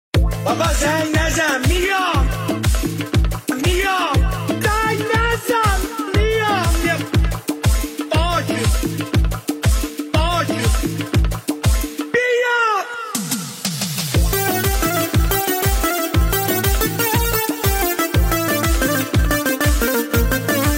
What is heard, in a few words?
Remix